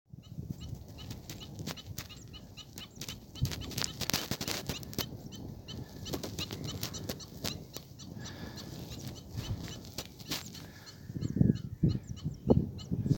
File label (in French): Echasse blanche